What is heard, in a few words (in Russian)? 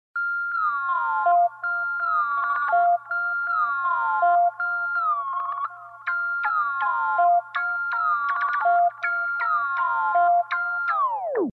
stilnaya smska klassnyy signal sms